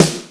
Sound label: Snares, Knocks